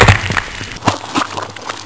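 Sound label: meat tongue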